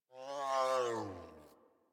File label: Pet Yawn; Enemies; Warframe; Catbrow; PetShip